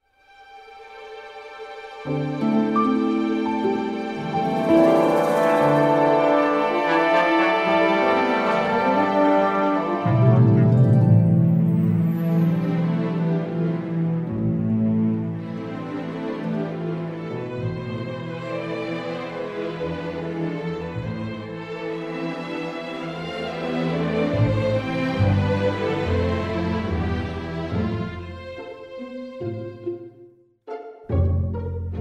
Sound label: Trailer Music; Bridgerton; Spitfire